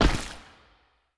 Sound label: movement step; smite; god odin